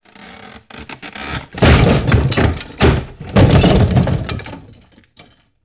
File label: Breaking through a wood